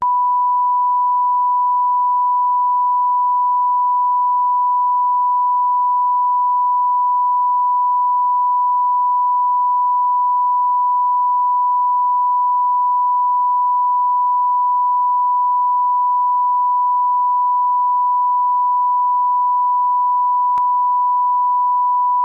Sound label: 1k Stereo 12 DB Down From FSD; 1K Tone, Stereo, 12 DB Down from FSD